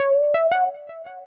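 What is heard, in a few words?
175 drum and bass Synth Bbm; Dance DJ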